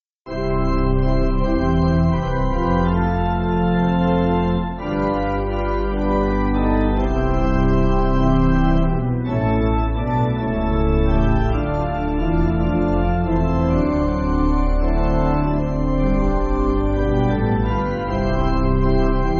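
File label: Pipe Organ, Backing Files for Churches; Mimi Lam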